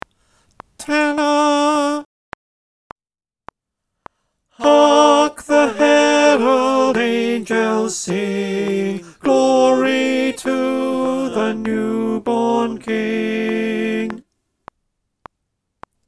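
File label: Tenor refrain, click, choir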